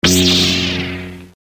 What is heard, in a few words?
lightsaber; star wars